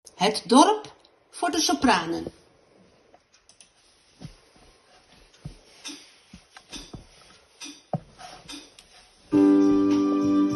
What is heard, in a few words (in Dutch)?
sopraan